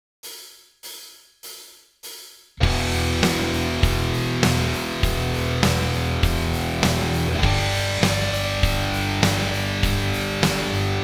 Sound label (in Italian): Backing track